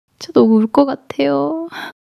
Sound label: crying